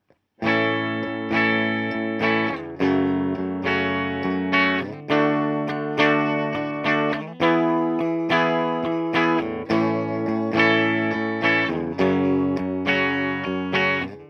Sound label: JCM2000 DSL401, guitar